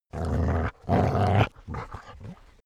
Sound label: border collie snarl; natur-tiere; hunde